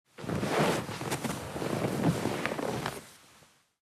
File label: Helicopter foley interior perspective boarding the helicopter